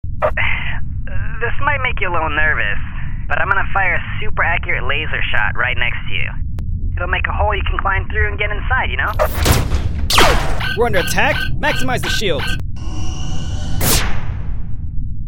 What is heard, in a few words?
Super Accurate Laser Shot